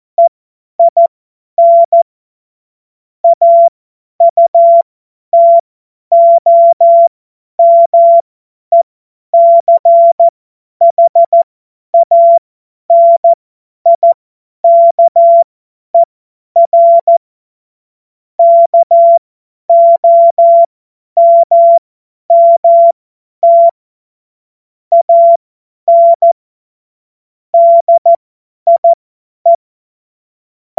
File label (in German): Witz, Morsekurs, cw morsen